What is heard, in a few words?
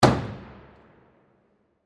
Hockey Puck Impact, Hockey Puck Slam Into Boards Glass Impact; SPORTS; SoundEffects-Blastwave